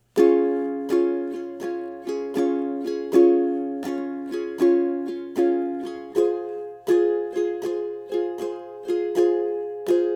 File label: Main Strum, ukulele